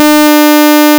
300Hz continuous, Sounder; Radio Voice Sounder with Beacon; SmartVOX

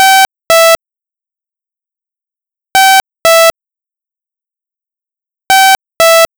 Two T Chime; SmartVOX

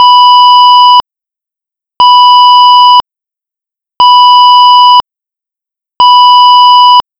1KHz 1s on 1s off Intermittent, Sounder; Radio Voice Sounder with Beacon; PFEER Gen; SmartVOX

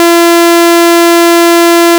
340Hz Continuous, Radio Voice Sounder; Radio Voice Sounder with Beacon; SmartVOX

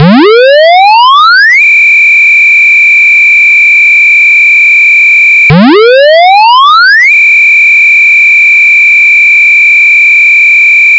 Motor Siren - slow rise to 2400Hz; Radio Voice Sounder with Beacon; SmartVOX

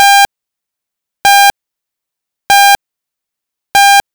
800Hz 0.25s on 1s off Intermittent, Sounder; Radio Voice Sounder with Beacon; SmartVOX

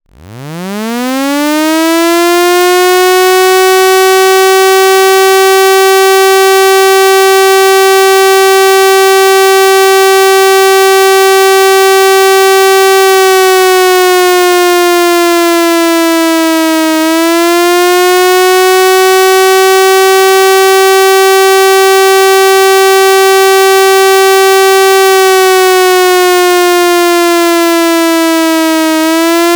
French - Signal national essai; SmartVOX

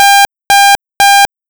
800Hz @ 2Hz Intermittent; SmartVOX

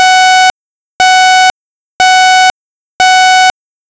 745Hz @ 1Hz Intermittent, Sounder; Radio Voice Sounder with Beacon; SmartVOX

